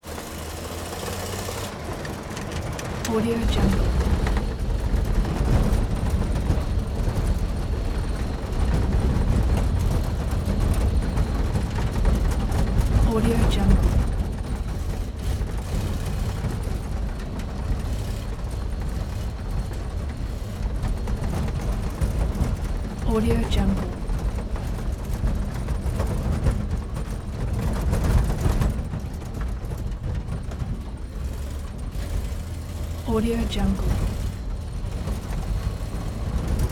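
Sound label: 1964 jeep j200 gladiator truck on dirt interior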